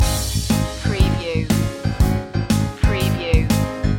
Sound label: sunny day loop